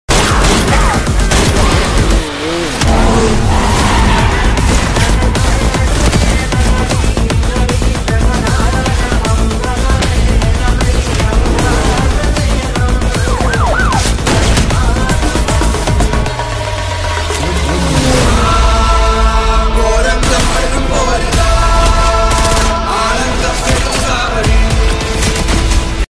movie trailer song ringtone; mammootty; vyshak

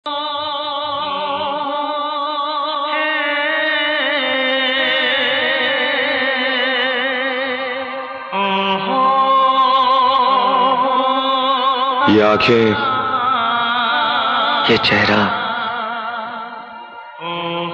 ringtone; dilwale movie

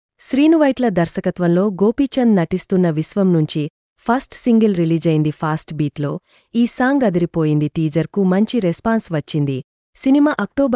fast beat song; Gopichand

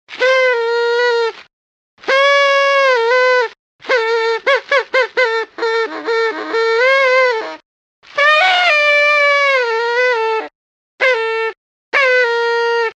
party whistle sound ringtone

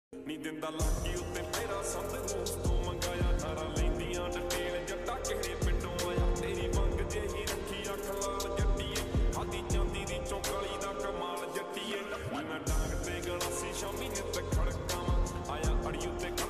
lofi song feel love deep; chambal

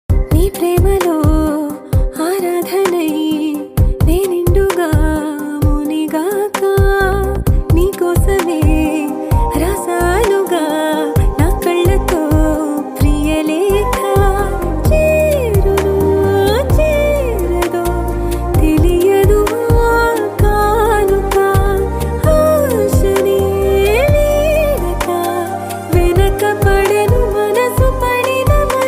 telugu love song; majili

